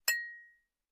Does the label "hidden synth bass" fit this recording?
No